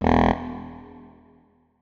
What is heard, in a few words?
notes, saxophone; Hideout Furniture